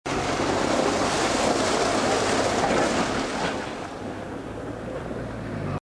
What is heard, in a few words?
Hagglund passing